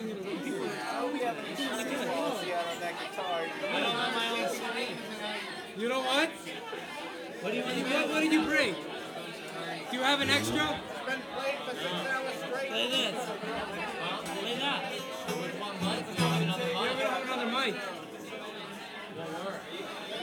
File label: string change; Bluegrass; ecm909